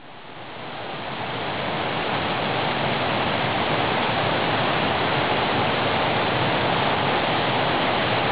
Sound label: creek